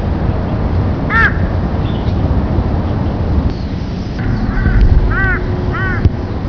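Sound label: Jungle crow, birdcall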